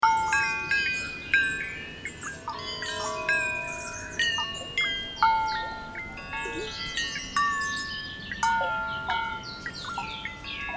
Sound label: water and birds; jem finer